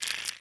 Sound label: slobber, clams; infestation